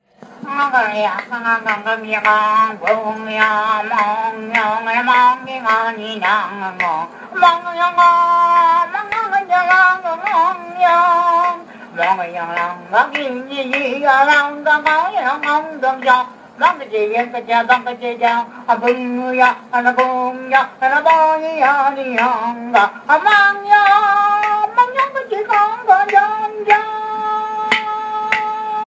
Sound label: Bunyip song